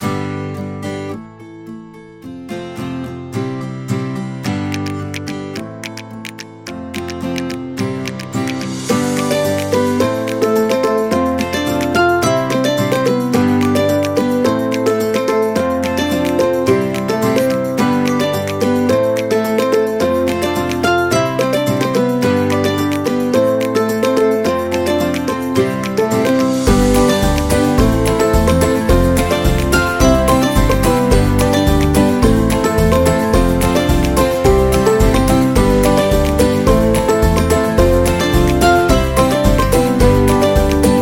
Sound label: Quirky